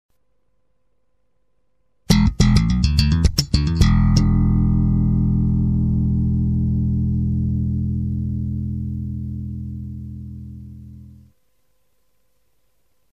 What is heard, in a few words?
lick loop